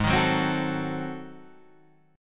G sharp 7th Flat 9th G sharp down Guitar Standard, chord; G#7b9; strummed; JGuitar